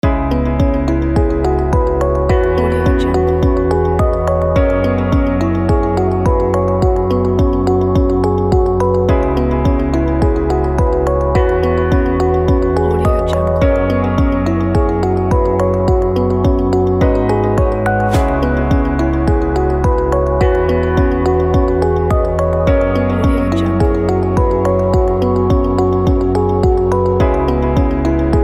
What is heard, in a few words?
clean inspiration